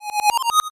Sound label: 8bit se